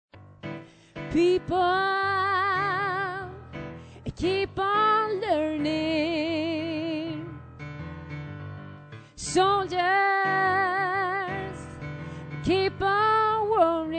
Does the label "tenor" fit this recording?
Yes